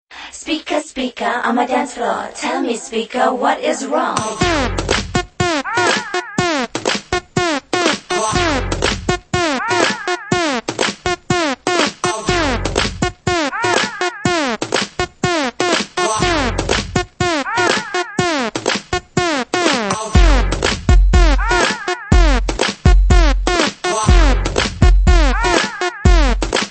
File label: Dance Floor